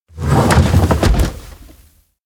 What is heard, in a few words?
projector place; anemoi